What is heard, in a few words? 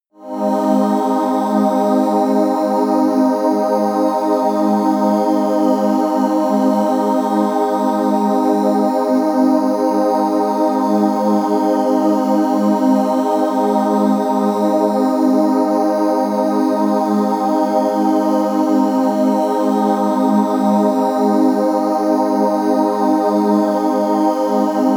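angel air, pads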